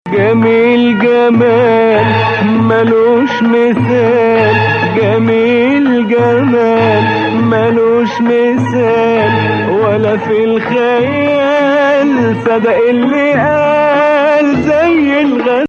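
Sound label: Nawa Hijazkar, Arabic music; actually goes all the way up to 9 in the last phrase; Maqam Nawa Athar; Hijazkar 5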